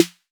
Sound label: 808 SD Orig, Snare Drum; Tape808; Goldbaby Tape808 & Tape909